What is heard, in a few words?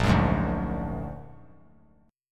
Bb Augmented 7th Bb down 3C,3F,3A,3D; Bb+7; strummed; JGuitar